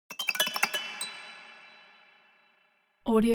musical horror haunted strings tinkle sequence fast short